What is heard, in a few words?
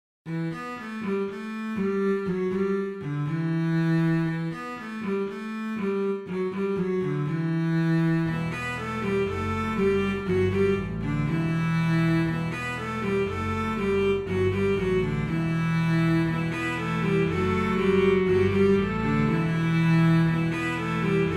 strings cello; captains strings